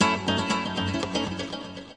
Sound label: spanish echo guitar; GUITAR LOOPS